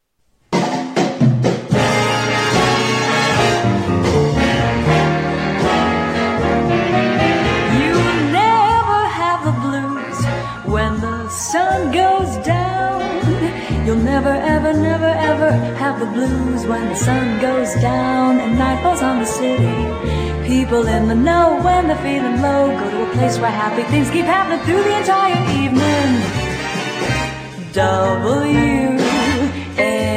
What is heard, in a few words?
Radio Jingle Blues; WNEW AM 1130 NY